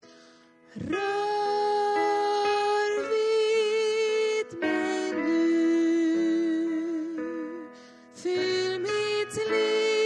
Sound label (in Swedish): sopran, stammor